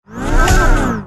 Peeoow!; Sony Ericsson; PlusFourSix; Laura (W580)

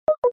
close; LG; SU780 Lollipop 3

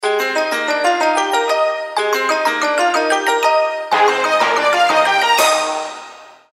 Tone; Samsung; SGH-A177

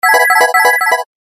Serene tone, Samsung SGH-J630

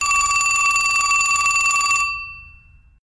Vintage Telephone; LG AN270 Prestige

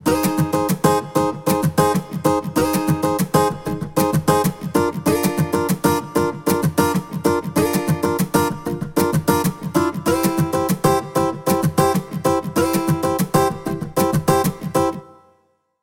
Guitar

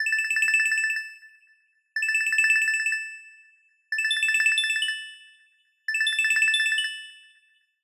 ringtone minimal; Alerts and Notifications; Google; Material Design sounds

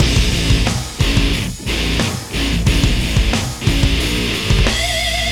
ringtone, rock; ringtones; Terminated; Google; NewWave Labs

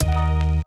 Hiphop enter application grid; Nokia E72; Audio themes

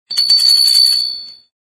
Wind tone; Samsung; GT-C3510T Corby Pop

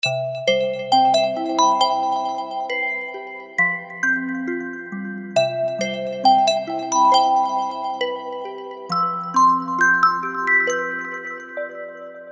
Bell, alarms

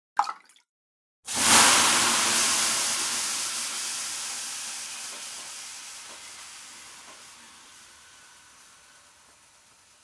Sauna, Nokia Alerts

